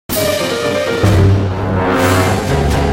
Fight Win; Silas; The Da Vinci Code; XBOX

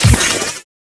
silver; Quantum Redshift

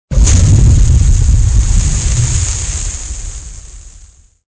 explosion water; weapons; Soldier Of Fortune II Double Helix; XBOX; explosions

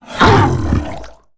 rakghoul hit; Star Wars Knights Of The Old Republic I; XBOX

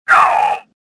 pain; Star Wars Jedi Knight II Jedi Outcast; XBOX

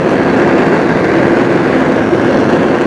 whoosh; Scenery; Decors

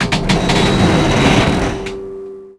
metal creak large

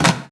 Wood plank freeze; debris; Max Payne

